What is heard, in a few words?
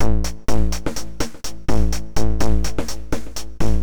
XTRA HOUSE 125